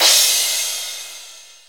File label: Cymbals crash; Vengeance Samples